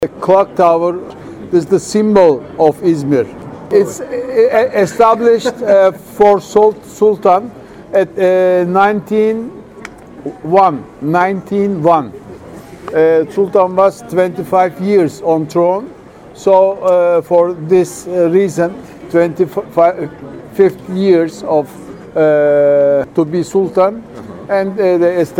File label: CLOCK TOWER